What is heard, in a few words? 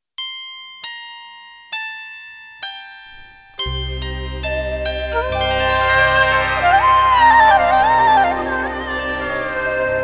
Santoor Flute